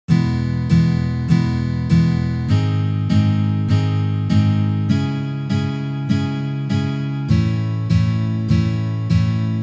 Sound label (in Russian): Uprazhneniya dlya igry akkorda Mi mazhor na gitare